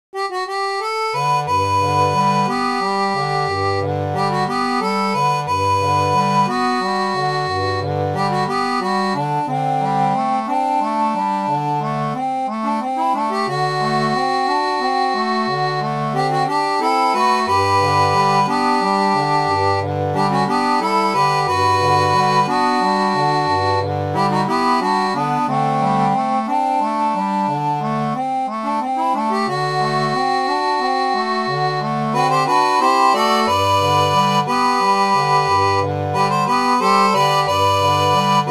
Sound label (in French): seraphone, orgue barbarie